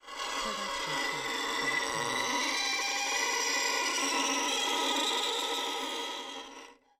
Creaky Screetchy Noise Long; soundscrate

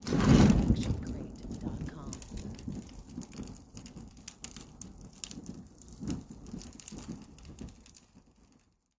Small Fire Lighting; SoundsCrate

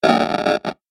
game glitch

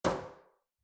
Metal Footfall; soundscrate

